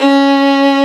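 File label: Vio legato C#3; Digital Sound Factory Virtuoso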